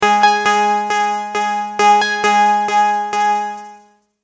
Multimedia Tone-Thinking/Processing; Sound Design; McKinney Sound

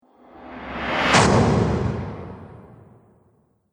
Whoosh Transition Explosion

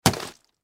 Pumpkin Smash Drop Splat; Food; Vegetable; Foley; Household; Blastwave

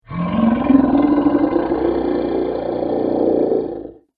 Dinosaur Trex; Dinosaur Sound Effects; animal

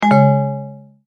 multimedia tone error

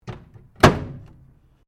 OVEN MICROWAVE WHIRLPOOL DOOR CLOSE; Common oven sounds; Appliance Sound Effects; Appliances (Sound Effects:Household)

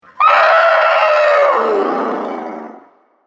Dinosaur Screech; Sound Effects:Animals

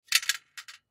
Curtain Rod Set Down Aluminum; Windows; Household; Blastwave; Foley

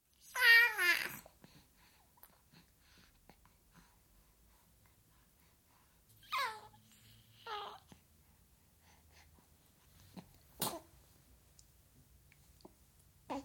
14 week old baby girl, playful gurgles; Baby Sound Effects; Babies (Sound Effects:Voice); BBC

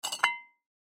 Tea Cup Lift, Tea Cup Lift Scrape Saucer Antique; Tea Cup Lift Fast Scrape Saucer Antique; Dishware; Household; Blastwave

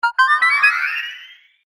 Game App Magic Spell, Video Game Sound Effects

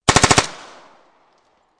MP5 9mm 5 Burst Field; MP5 9mm 5 Bursts Bridge; VRSonic; Guns

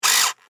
Office Pencil Sharpener Electric Rev Single; Pencil Sharpeners

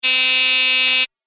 Apartment Buzzer Long Press, doorbell

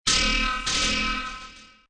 Sci-fi, space metal impact; Sound Design; Lynne Music

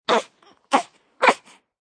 Baby Grunts, Newborn baby sound.; Babies (Sound Effects:Voice)

